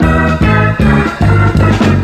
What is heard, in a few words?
horns, phonky loops